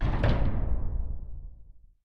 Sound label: ui close